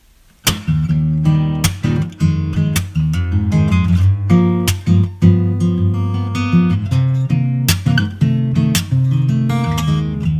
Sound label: Instrumental Sketch